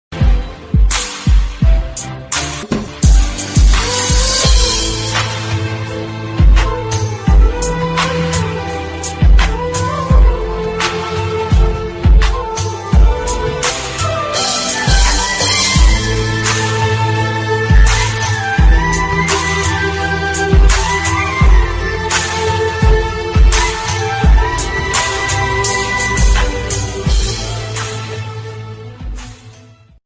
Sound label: bgm